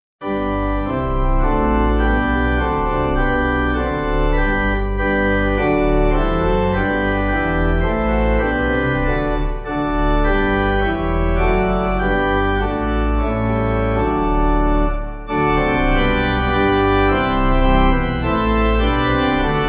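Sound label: Pipe, Pipe Organ backing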